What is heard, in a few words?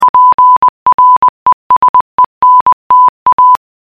morse, ringtones